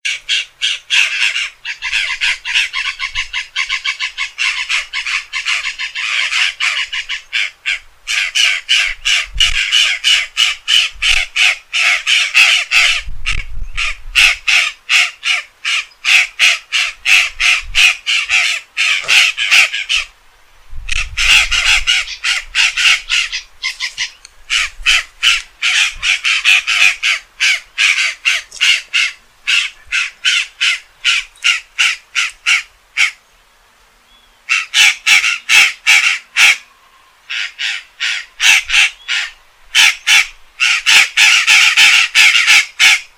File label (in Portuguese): Canto da Gralha do pantanal